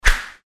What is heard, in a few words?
Fighting Swing Sound; FXHome